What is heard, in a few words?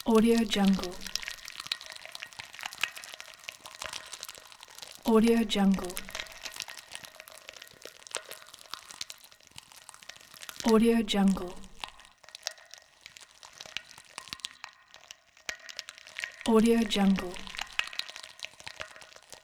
cooking popping; domestic sounds